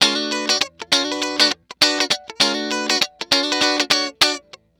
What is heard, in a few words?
Electric Guitar; macmoney